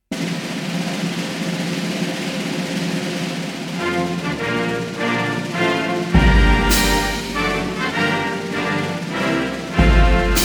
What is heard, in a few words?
star spangled banner army field